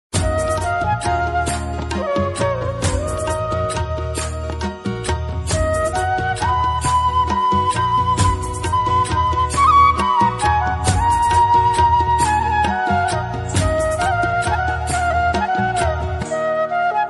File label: flute cover instrumental bgm, sad ring tone